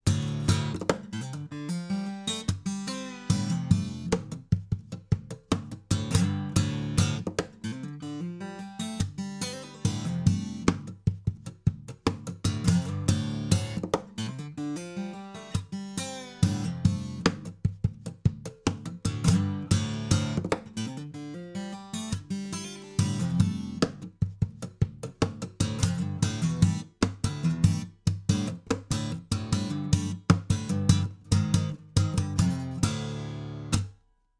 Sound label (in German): perkussiv lowcut, fame pro series sd-100